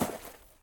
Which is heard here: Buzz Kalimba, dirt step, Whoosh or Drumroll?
dirt step